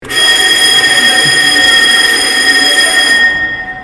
klassicheskiy metallicheskiy zvuk shkolnogo zvonka

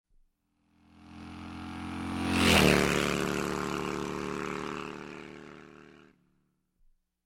zvuk mopeda; zvuk motora

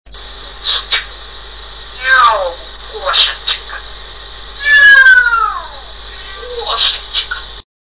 popugay parodiruet koshku; zvuki popugaev

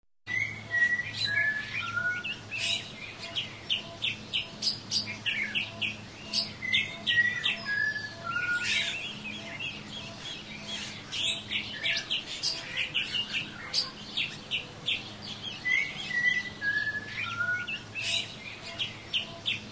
zvuki lesnyh obitateley, zvuki lesa; zvuki prirody

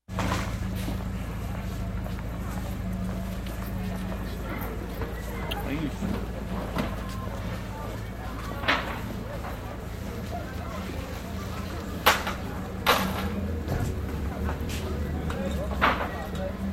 ozhivlennaya gorodskaya ulica; zvuki goroda; gorodskaya sueta

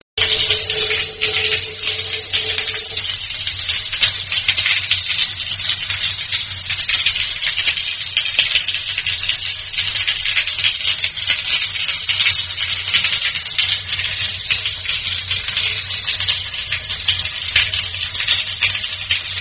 zvuk vody lyuscheysya na metallicheskuyu poverhnost

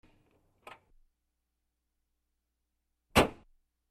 zvuk zahlopyvaniya dveri avtomobilya; zvuki avtomobiley

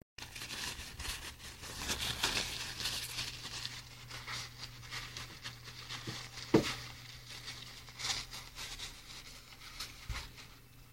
skomkovanie bumagi

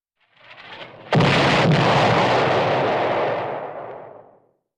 gromkiy vystrel iz chego to bolshogo; zvuki vystrelov; ba-bah

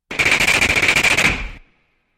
preryvistaya ochered iz avtomata; zvuk avtomata; ba-bah

